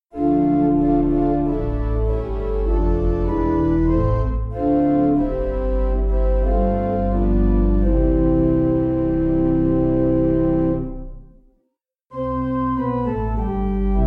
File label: Pipe